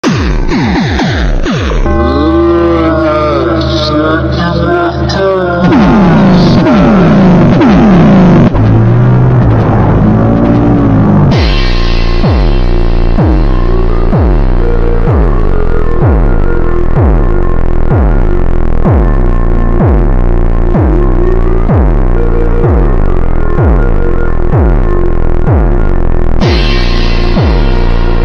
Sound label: ultra slowed reverb